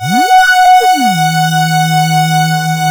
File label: OSCAR F#5, Synthesizer; Hollywood Edge - Giorgio Moroder Rare Synthesizer Collection